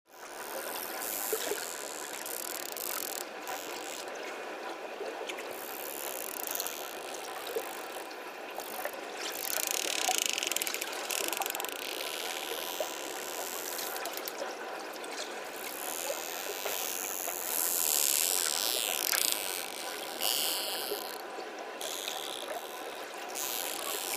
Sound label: Dolphin Bottlenose